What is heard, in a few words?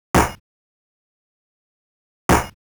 Hit; Oretachi Game Center Zoku: Nekketsu Koukou Dodgeball Bu